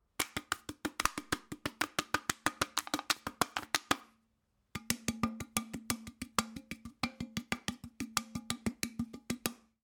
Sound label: Beer can Finger Tapping